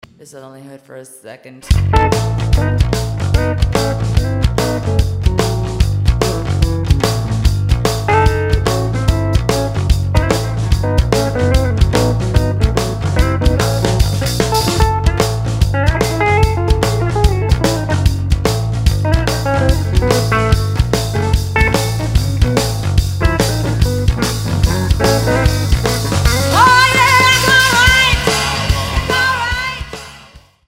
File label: jazz solo, guitarist